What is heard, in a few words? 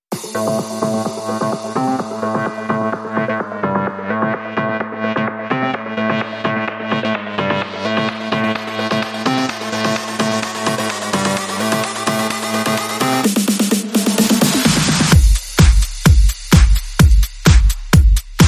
Bass and Beat